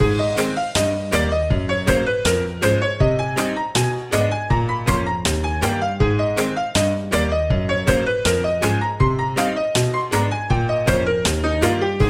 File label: Childish Theme Loop